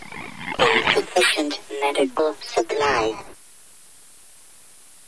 zombie alert

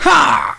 pain

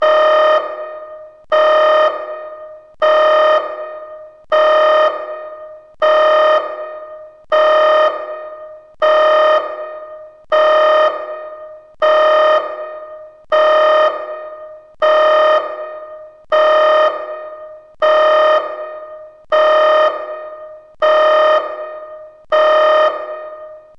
red alert; startrek

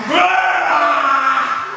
death; saitama

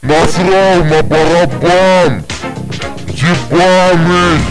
baraban

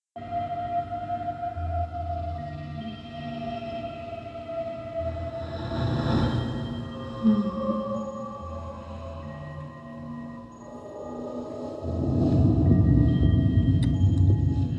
Alien amb; Resurgence

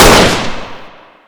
elite, weapons

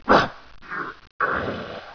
pain; zombie barney

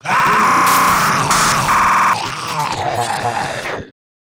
zombie alert; custom monsters; glitchzombie

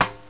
metal, debris

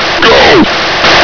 charge

